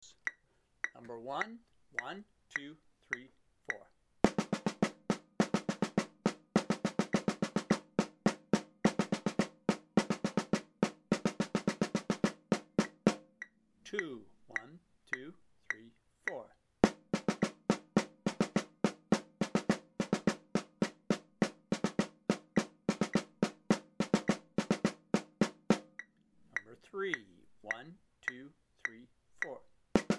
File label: one beat sixteenth note rhythms